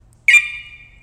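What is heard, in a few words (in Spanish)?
activacion, ringtones de alarmas; Sonidos Positron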